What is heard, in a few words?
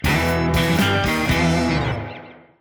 Stingers; Disney Infinity